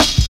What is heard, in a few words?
Drum Loops, Jungle